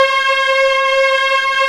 strings slow, string orch; Roland LCDP13 String Sections; STR Combos 2; CMB StringOrch 2

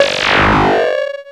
VCS BELL; Zero-G; Ambient 1

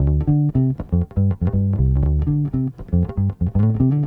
Bass, 70s Analog Funk

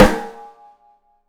OPEN BRASS, 3D Drums; 3D Sound

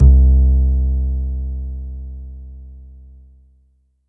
Ac Bass, Yamaha DTXpress misc voices

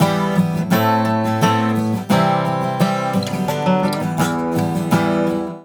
GTR, Celtic Flavours; USB Soundscan vol.42; AKAI

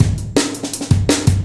Heavy Live A, Drum Loops, Jungle; Zero-G Jungle Warfare 1, 2 & 3

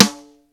SNARE, DRY; Crypton - DrumBase5000; DRY KITS

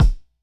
Punch All Business, Kicks; Airwindows

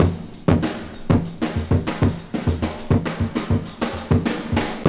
Loop, Roland; Sound & Vision - Gigapack I

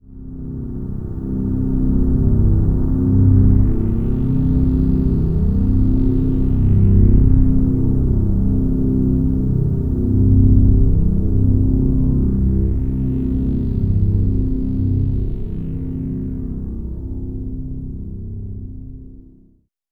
Low Complex Drone; Sonic Foundry (Sony Creative Software) - James Johnson Spektral Minimalism

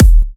Kick Drum Dirty

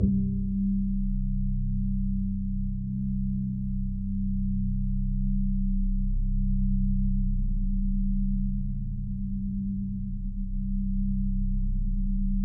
C2, Synth Line

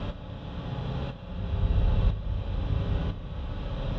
Atmos 120, sidechained

